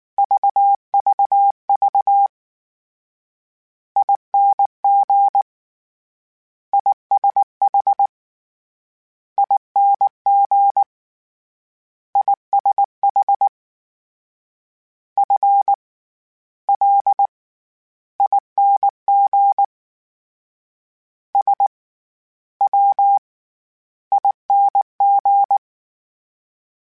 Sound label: Morse Code, CW Code Groups; HamRadio; Mixed